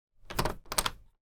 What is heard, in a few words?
household caravan front door lock or unlock